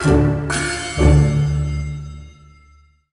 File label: Sting Victory; Mini Game Tienka